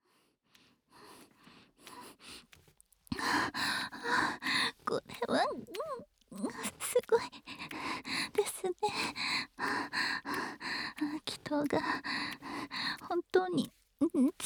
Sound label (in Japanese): 耳舐め, ASMR; 耳舐め騎乗位